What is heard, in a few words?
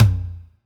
Tom, 909 Toms; Slate; Vintage Drum Machines Volume 3 909 Edition